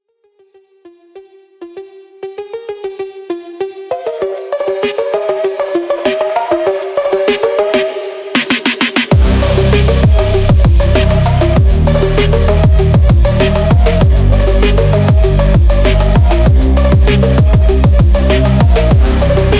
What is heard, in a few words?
drift phonk